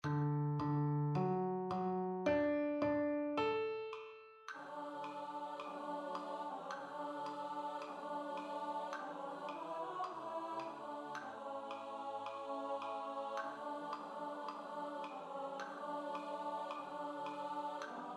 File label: ALTO STUDY